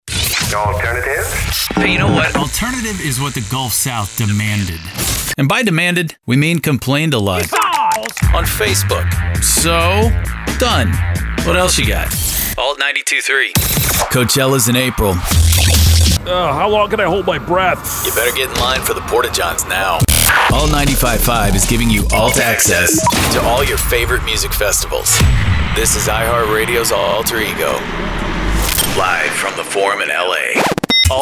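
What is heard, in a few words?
Alt Rock, jingle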